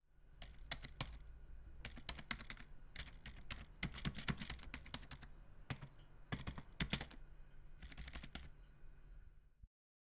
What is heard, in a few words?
Keyboard No Effect